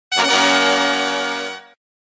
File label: Ta-Da Sound Effect